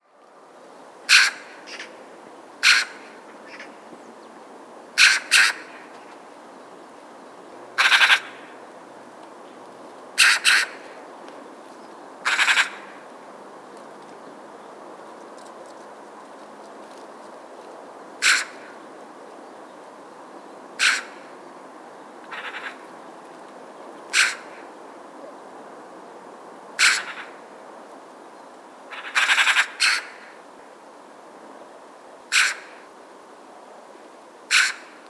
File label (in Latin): Pica pica; SETE